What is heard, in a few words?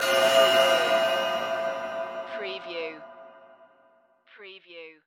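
Production Element Hit Bell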